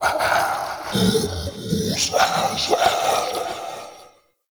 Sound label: Mockery Male Vocal Death; Characters; Enemy; Vocals; Mockeries